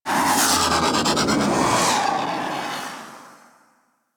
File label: Fighter Ship Attack Maneuver C, Space Fighter Ship Engine; Warframe; Railjack; Enemy Ships